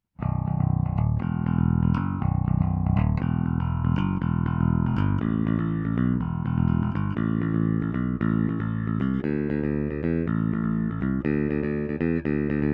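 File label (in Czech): S-S trsátko, nerezová ocel, Roundwoundy, Schecter SLS Elite-5 baskytara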